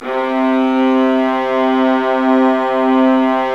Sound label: STR, Vas2 Bright; Roland L-CD702; Vlas Sect1-2